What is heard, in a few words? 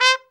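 BRA TRUMP, trumpet, brass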